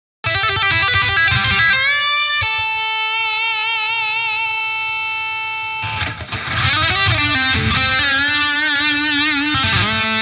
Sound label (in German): Modern Gain 9 Boost an